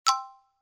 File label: tada